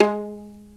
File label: PITZ STRINGS